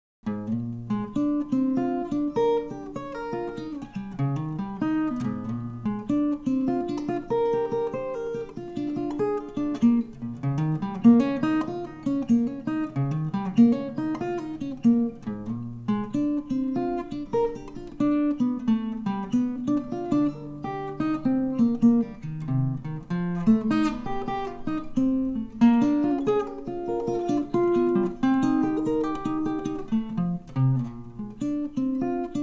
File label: chord tone blues